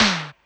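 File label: Percussion pss795 Electro Tom High; Polyend; Legowelt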